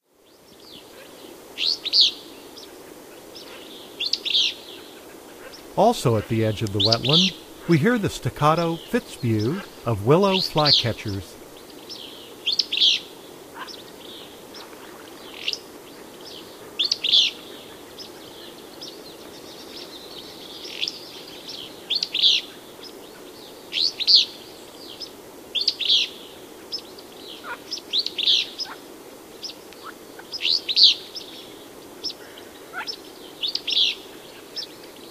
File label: Willow Flycatcher; North America; Common Western Species; Know Your Bird Sounds